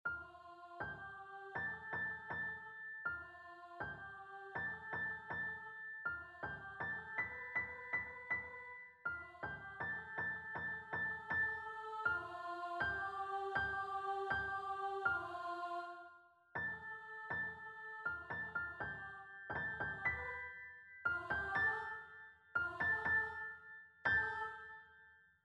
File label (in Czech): lesni kapela